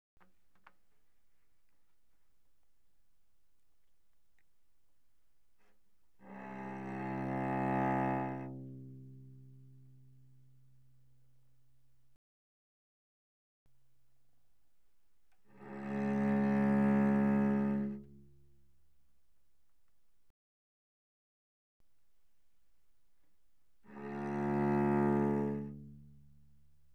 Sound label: Cello arco pp sul C C2Gb2; Strings